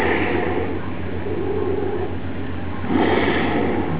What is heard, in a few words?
whale blowing